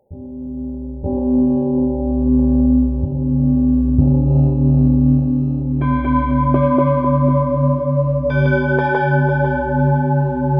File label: Mini Gong